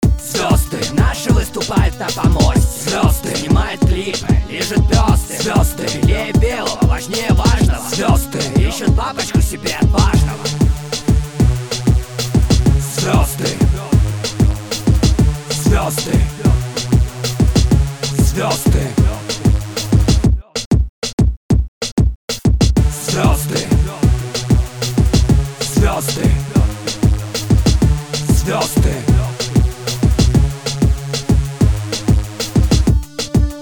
dnb remix

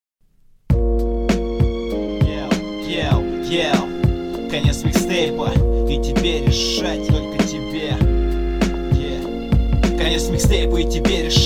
instrumental

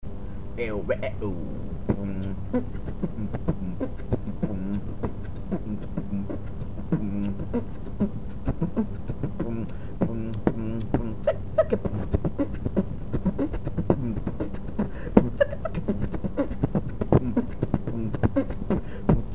beatboxing

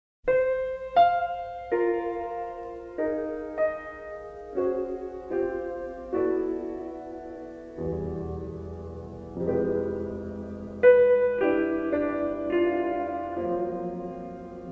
instrumental

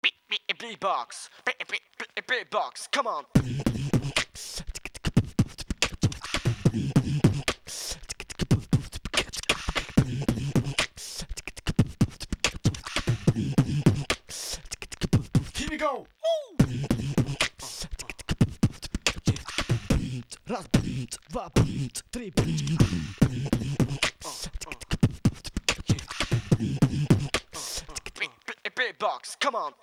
beatboxing loop

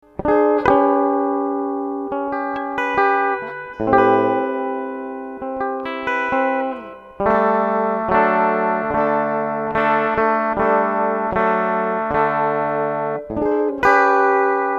hum clean

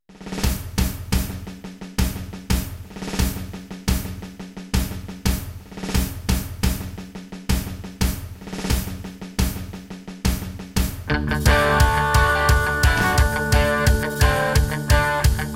acoustic

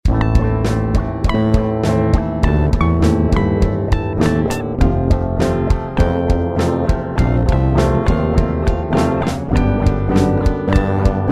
instrumental

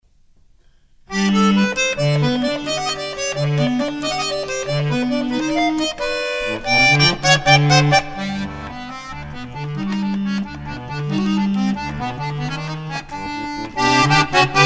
suita piano

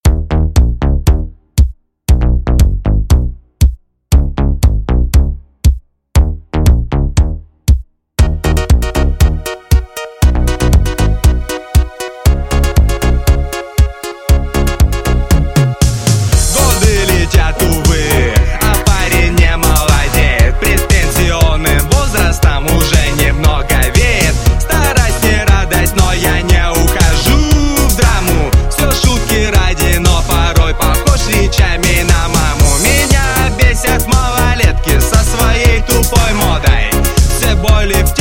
italo disco; bootleg

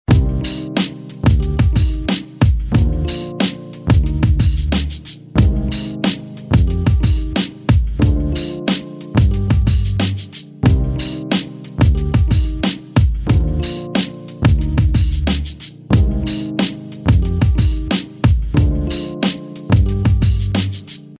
jazzy beats

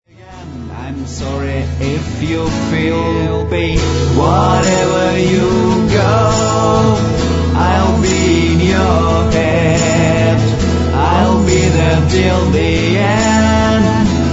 desert wind

